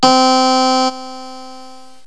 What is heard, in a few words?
HARPSIC3